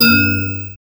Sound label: short metallic spring boi